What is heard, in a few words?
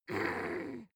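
female angry, screams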